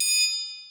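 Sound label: Triangle open, Yamaha SY22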